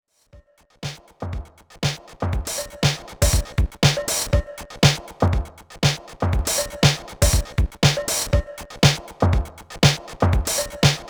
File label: CMI V Drums